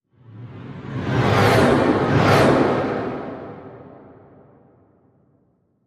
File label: fly by double pass